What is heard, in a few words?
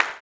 CLAP, blues & soul; ILIO; Vocal Planet